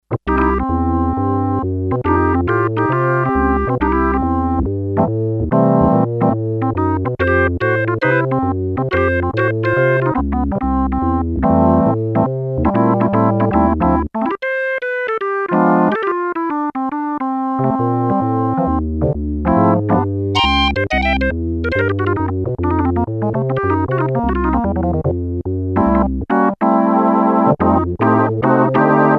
Percussive Organ 3, Sequential Circuits Six-Trak; Factory Programs

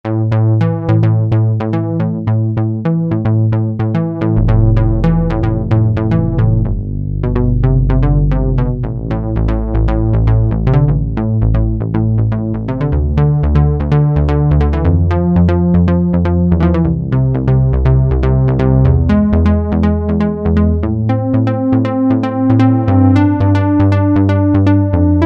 MEATY, Kawai SX-240

